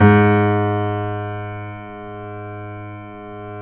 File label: CONCERT PF G#1; AKAI S-Series CD-ROM Sound Library VOL-7